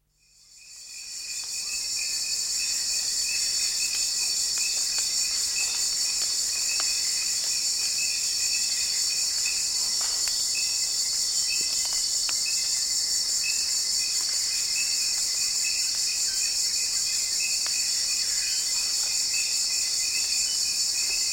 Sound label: rainforest; bbc